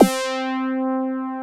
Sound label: SAW BASS; MORPHEUS